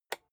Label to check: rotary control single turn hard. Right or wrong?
Right